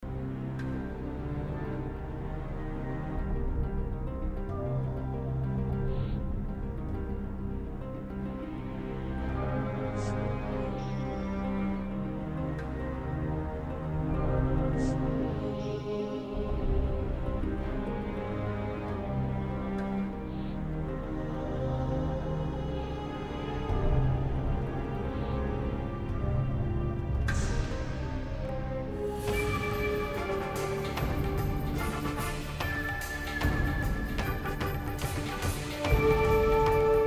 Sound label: Underwater Action Sequence; Turbo the Movie